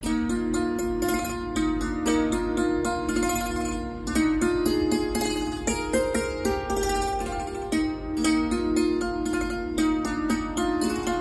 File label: Instrumental